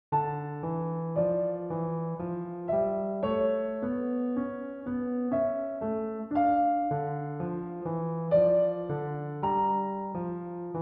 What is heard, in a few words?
Keyboard Sonata in G Minor; 'Cats Fugue'